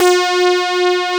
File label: SAW WAVE G4; Greytsounds; Scott Peers Sound Engineering